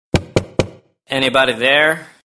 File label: Elegant Simple Sms